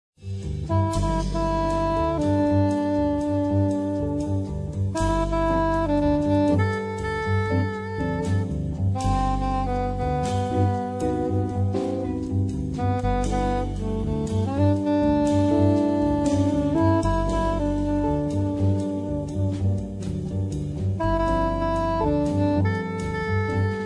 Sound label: Piano